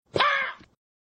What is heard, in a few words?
Pow